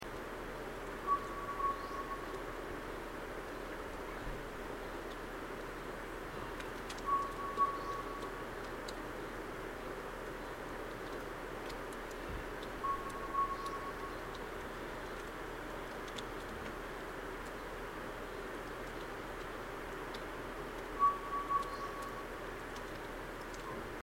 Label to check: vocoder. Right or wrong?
Wrong